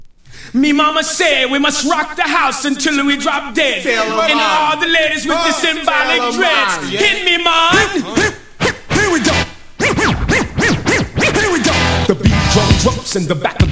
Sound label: bongo beat